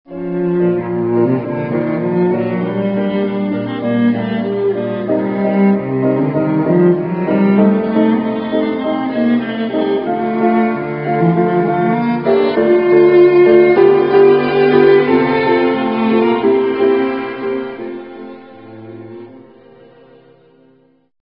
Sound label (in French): violoncelle chambre; 1er mouvement du quintette pour piano et cordes en mi bémol majeur de Robert SCHUMANN